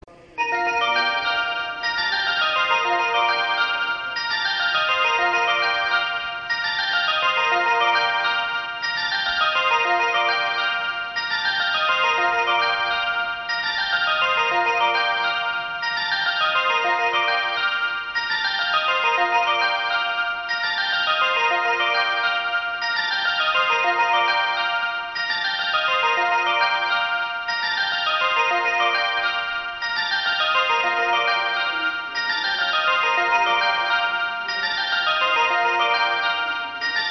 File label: Oyama melody; jreast; utsunomiya